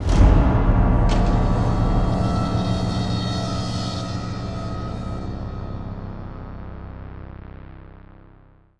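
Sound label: sting, percussion end; transformers war for cybertron gamerip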